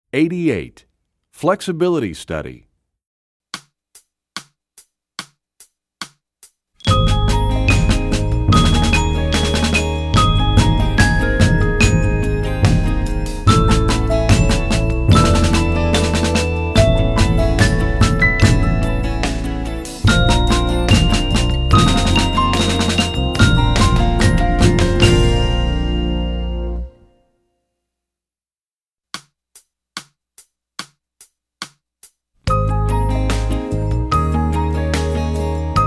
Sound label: Flexibility Study, Percussion; EE2000 Book2